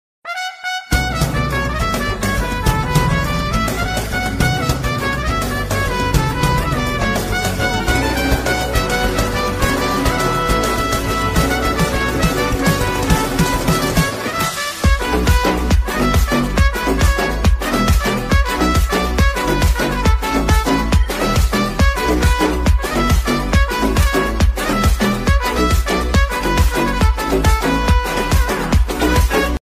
bgm ringtone tamil bgm ringtone; aavesham; young ranga

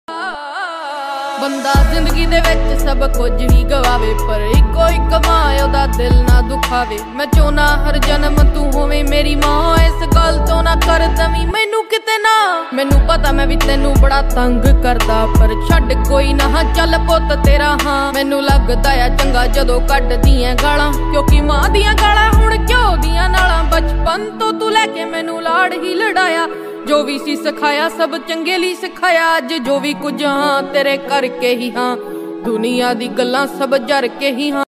ringtone

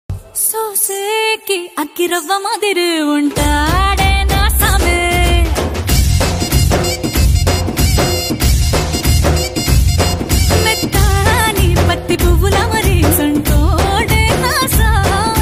song tamil dance

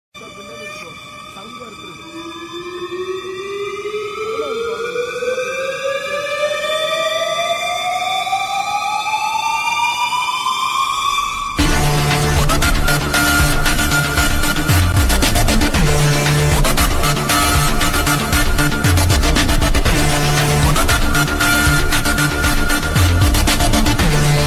mass bgm; yennai arindhaal; thala ajith

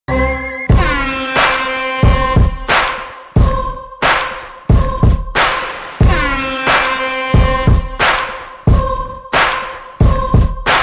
theme music ringtone; mankatha; instagram story musics

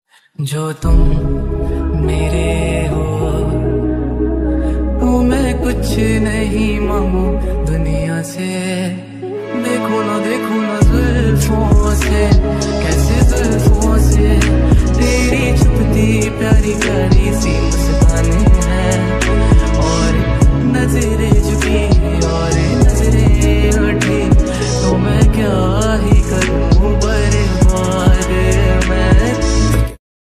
romantic ringtone hindi song ringtone

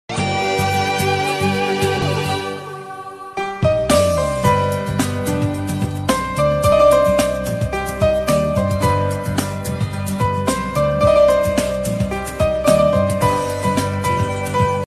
instrumental

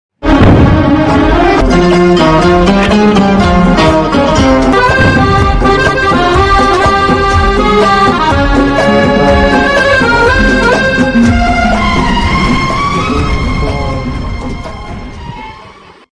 romantic ringtone love ringtone

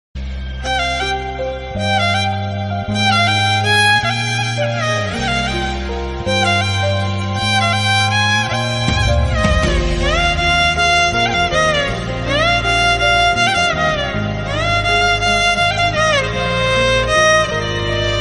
emotional bgm, love failure bgm; husharu movie

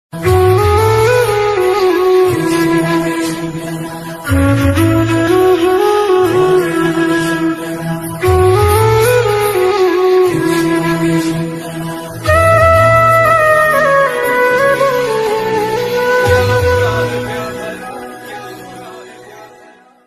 ringtone flute; mahadev ringtone; mahakal ringtone

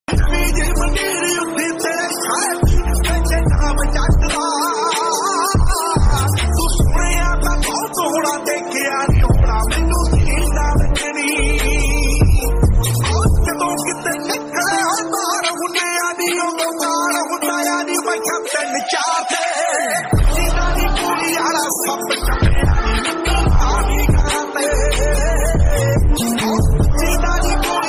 Punjabi songs ringtone

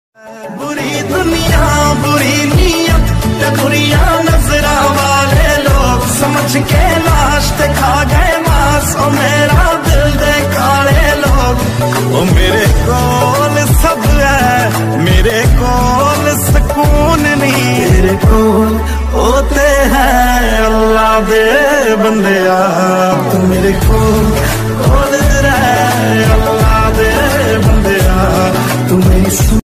punjabi song ringtone

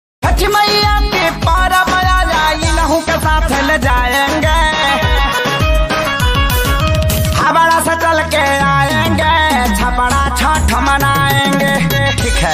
chhath puja ringtone bhakti ringtone

